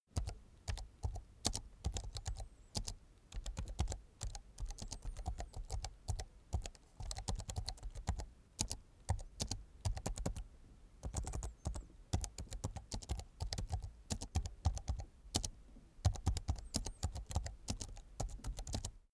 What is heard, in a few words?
keyboard laptop type sequence slow soft